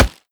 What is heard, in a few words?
cardboard, bullet impacts; Half Life Model Pack